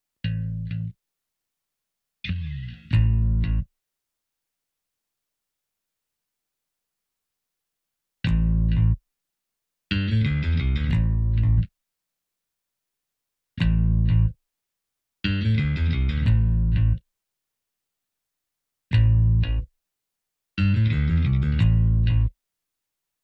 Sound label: Bass Only